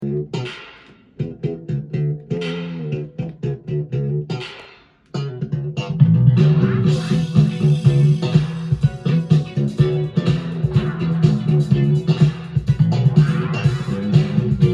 Bass

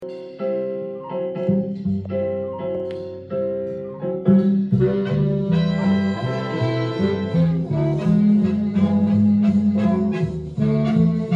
Jazz Concert

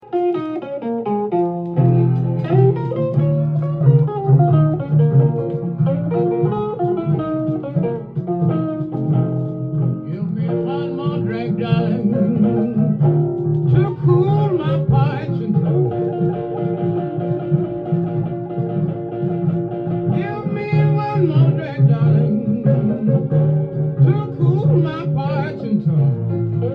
slide guitar

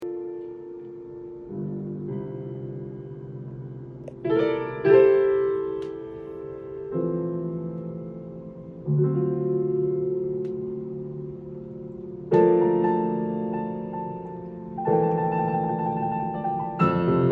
Piano

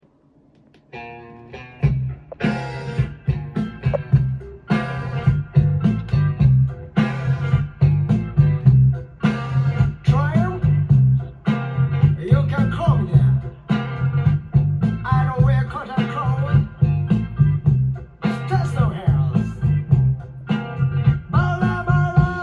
Blues

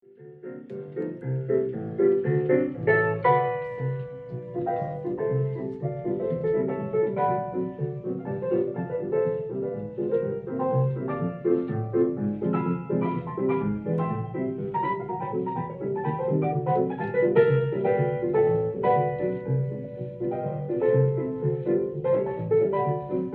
Stride Piano

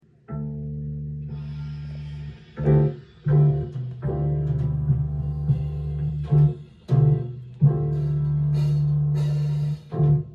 Quartet Piccolo

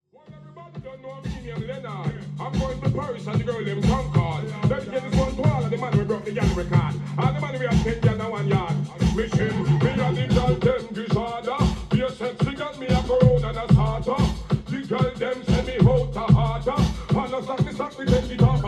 Reggae

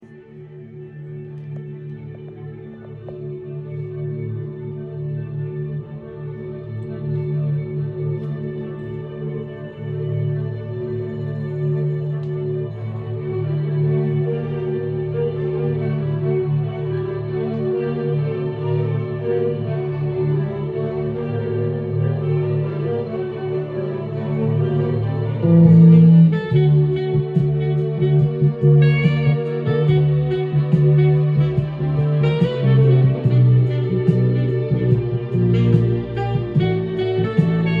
Strings

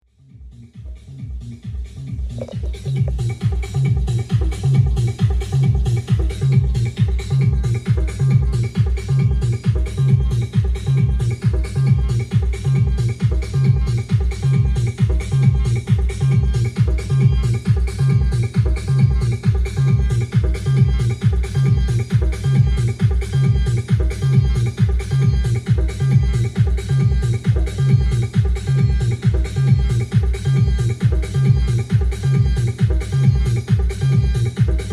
Organic Synthetic Techno

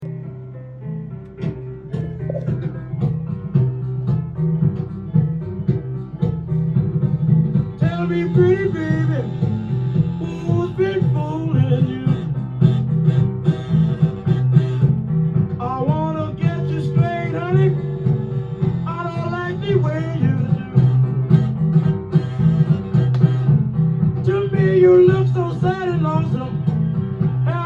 New Orleans Piano

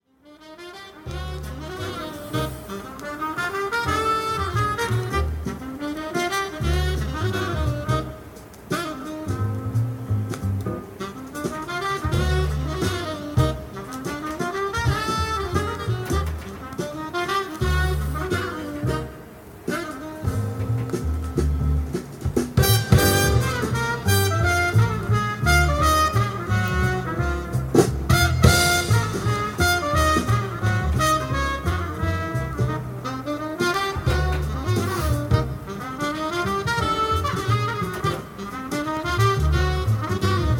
Jazz Quintet

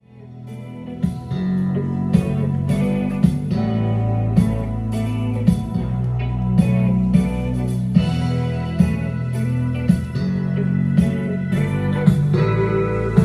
Loud Jazz

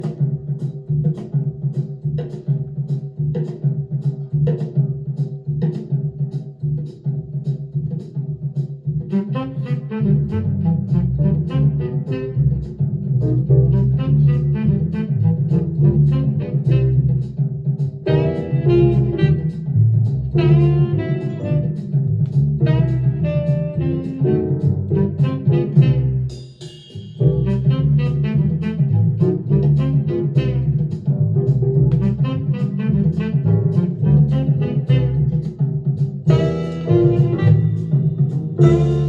Saxophne

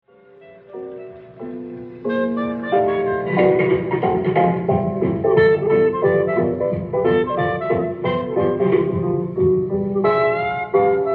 Jazz Concert

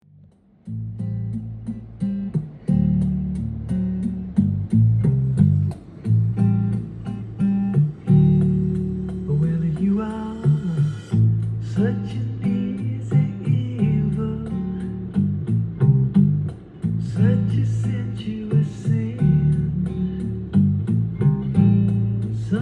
Singing Playing

